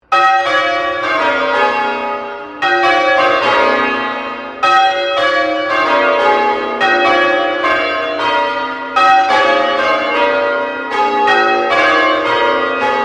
Bell St Pauls Cathedral Melbourne Bells Sequence; The bells of St Pauls in Melbourne; Bells (Sound Effects:Musical)

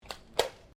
GUN TOY DART PISTOL COCKING

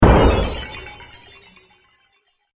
Fantasy Thud And Shatter; Hit Sound Effects; Andrew Fleming

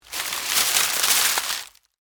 Foliage Crunch, Movement, Palm, Frond; Texture; Rustling; BlastWave

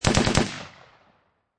Machine Gun Ak-47 Short Burst

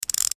Gadget Gear Clicks; High-Tech; Multimedia; Blastwave

